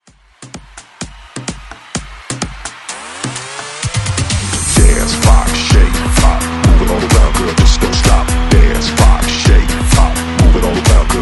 Hype Re Drum 128 Bpm

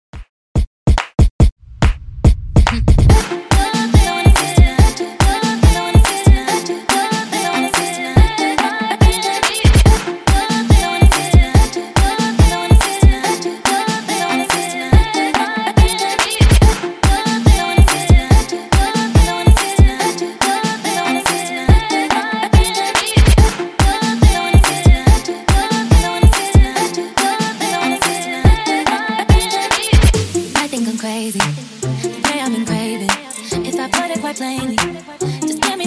Club Remix Redrum; Clean n Dirty

